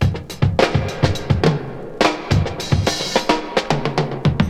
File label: 107 bpm fresh drum groove f sharp key